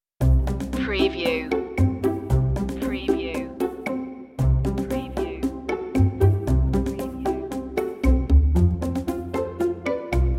strings and perc only

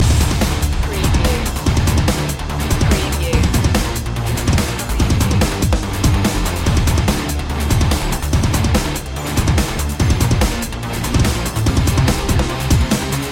arcade rock loop; Soundtrack / Action / Chase

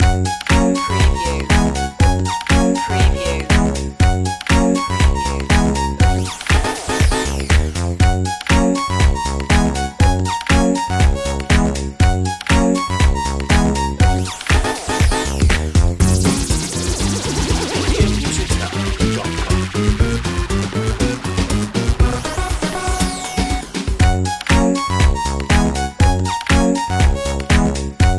retro video game tomorrowland long looping

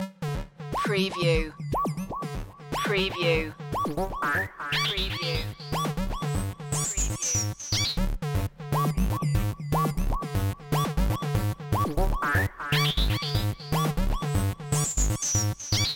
electro stomp loop

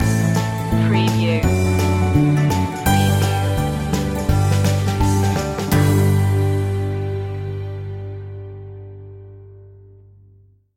easy pickings stinger

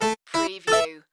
Casino Slot Machine Harpsi

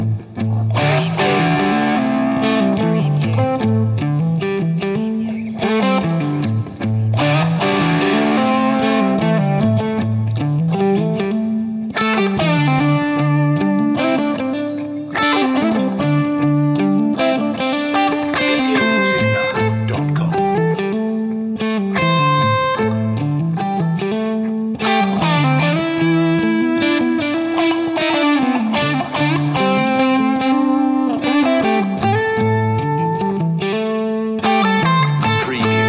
rough guitar loop

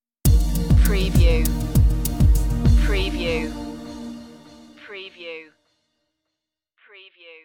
space age stinger

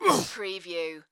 Pain Umph, Male Painful Grunt, Hurt

